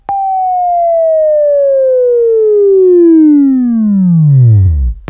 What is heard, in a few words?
Buzz, Sweeping Training